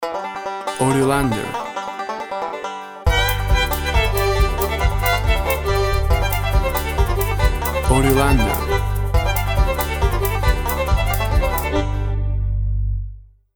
Irish carnaval

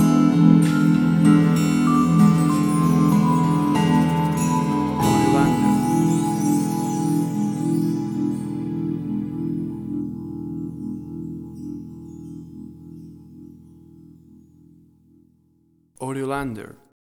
Babies Music Theme Stinger

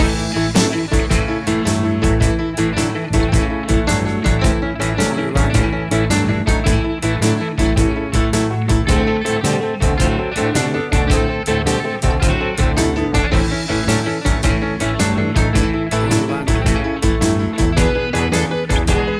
Blues

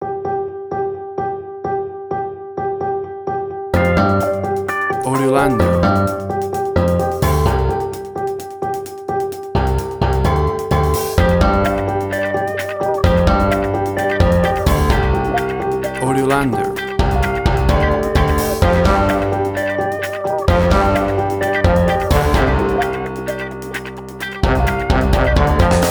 Brass Balls, Suspense, Drama, Quirky, Emotional

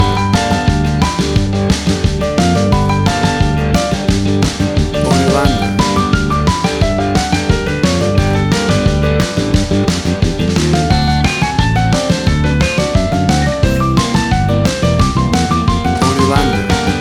Jukebox Country Shuffle Rodeo Music; 30 SEC

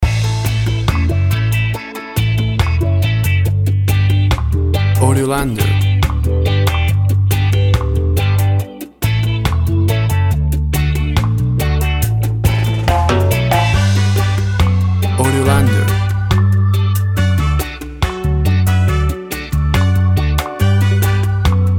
Virgin Islands Reggae Music

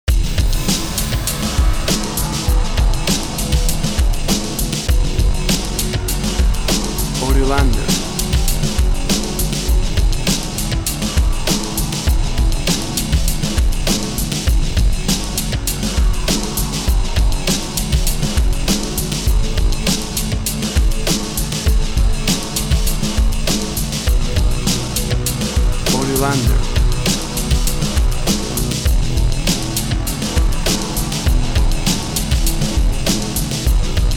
Wind

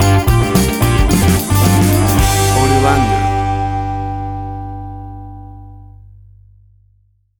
Bluesy Funk Riffing Stinger; AUDIOLANDER

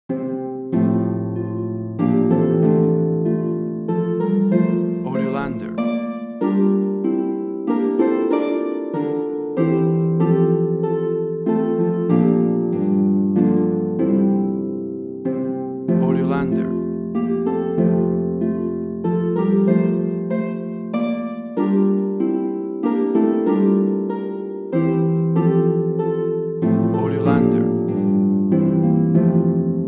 Away In A Manger Harp; A gorgous Harp version of this festive holiday christmas carol.

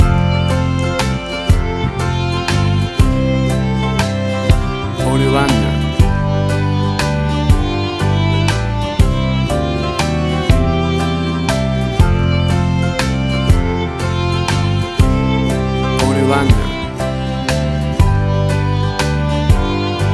Country Waltz Romantic Ballad; 30 SEC

